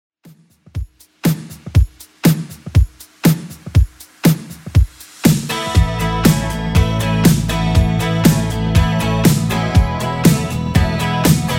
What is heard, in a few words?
80s Simple Redrum 120bpm